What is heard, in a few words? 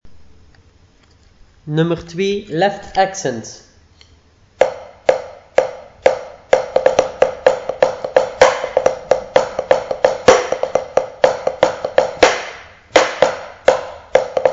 left accent